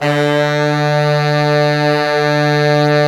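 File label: brass, Fat Pop Section; Roland L-CD702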